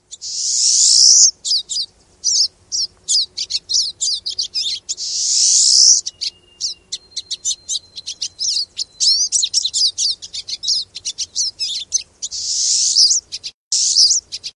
pine siskin

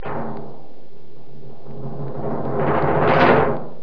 musical

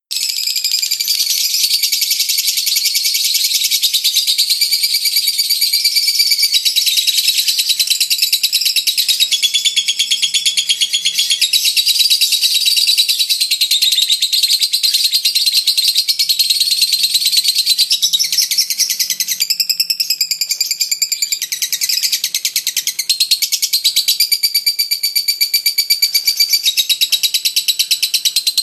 lovebird

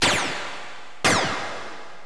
scifi

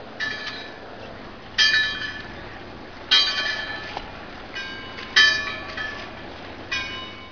nautical

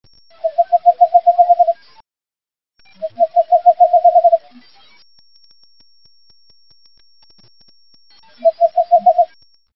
megascops kennicottii

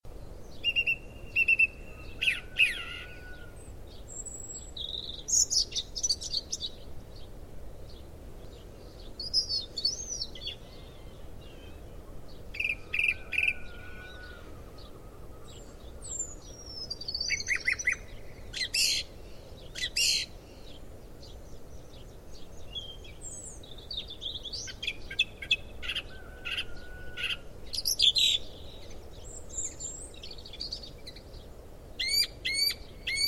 song thrush

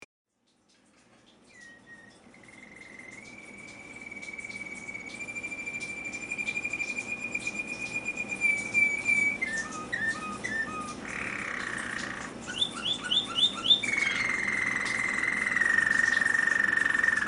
american singer canary

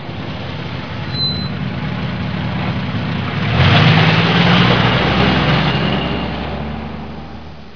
trucks

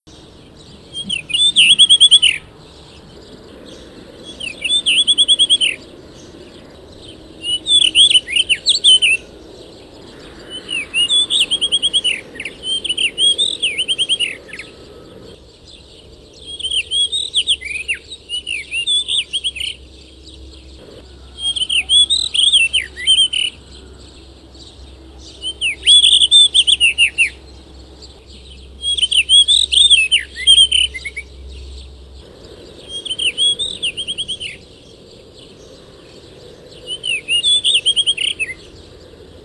bluerock

